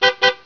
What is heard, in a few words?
Notify; ircle 2.5